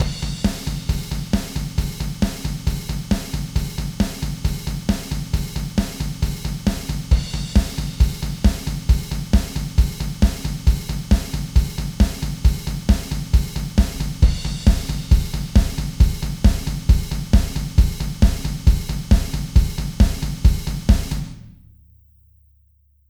kick

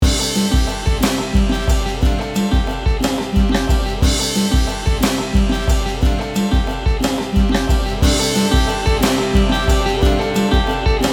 EMT140

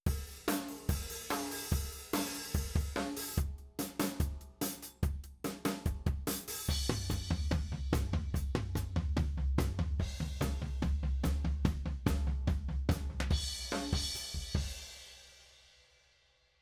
drum; GR 2

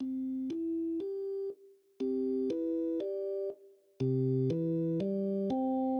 organ sub

